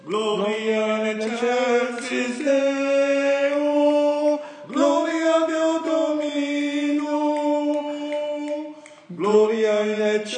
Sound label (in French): gloria, ténor